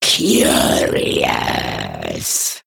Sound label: Female Raptor Attack